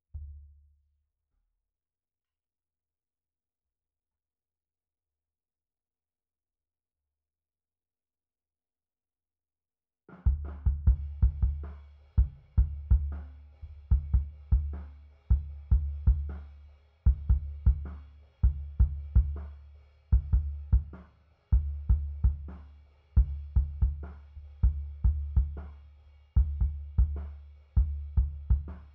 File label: Kick SUB